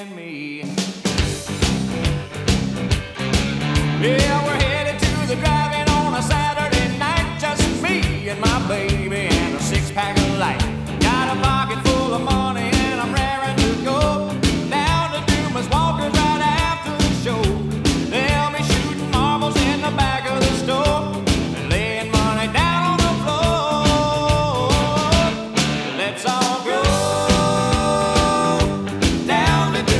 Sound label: country